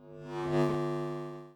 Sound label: magnet Detach; Kerbal Space Program; KAS